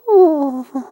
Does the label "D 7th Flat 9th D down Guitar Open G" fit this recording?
No